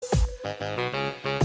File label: sax